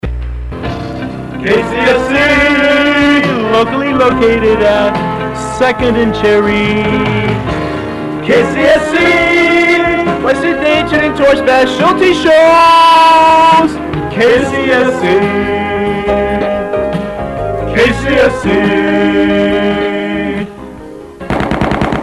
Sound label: KCSC Bumper 80s Song; Middle Finger Solo Show